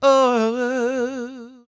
CROON, Vocal Planet Blues & Soul; CW SOUL

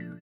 VIBRA; Spectrasonics; Metamorphosis

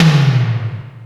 Tom, Yamaha SY22

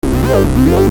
Syn-Bass m C, Techno Synth Loops, 140bpm; Sonic Foundry (Sony Creative Software); Signals I; SynBs

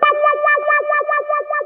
GUITAR; InVision Interactive; 40 Oz Phat Slammin Hip Hop

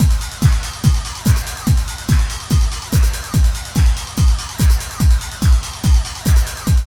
LOOP, Tekno Industrial; East West

